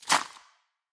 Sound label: winter tile drop